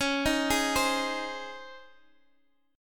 Db Major 7th Suspended 2nd, Guitar Half Step Down; chord shape

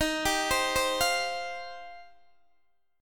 Eb 6th Add 9th, Guitar, Open D; x,18,17,18,15,15

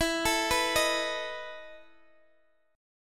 E Major 7th Suspended 4th E, chord

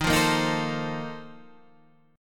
Bb Major 7th Suspended 2nd Suspended 4th

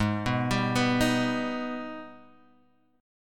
Ab Minor Major 7th, down, Guitar, Standard; AbmM7; arpeggiated

